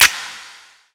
Enemy Fashion Skill Change; disney star smash android ios mobile gamerip 2020

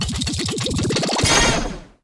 Nav Bar Button Unlocked; Subway Surfers City Sound Effects; Android

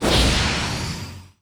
Team Ball Fly High; Disney Star Smash; Android, iOS, Mobile

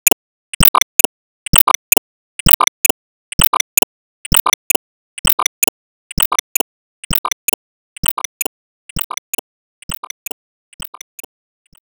Foot Man Loop

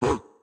Chop Attack; Caffiend Caelan

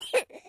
Vomit; Kindergarten Online

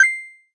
coin; Subway Surfers City Sound Effects; Android

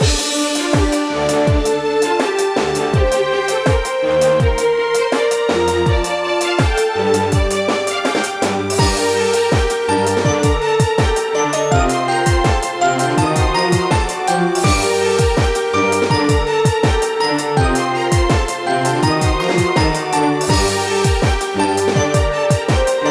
Orchestral Boucle; Imagine Modern Dancer; Nintendo DS

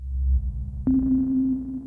portal exit; 0°N 0°W

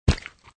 Meat Meat Feet slow

